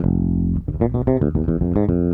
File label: Raw Funk Bass, 70s Analog Funk; Sonic Foundry (Sony Creative Software); What it Is! 70s Analog Funk